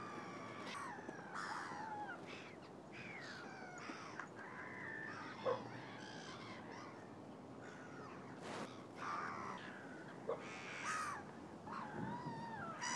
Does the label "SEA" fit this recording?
No